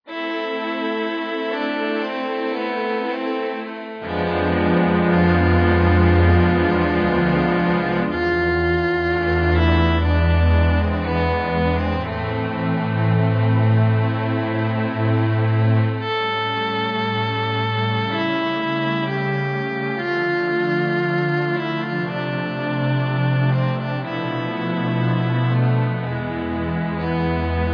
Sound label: sad, music; pbskids; go studio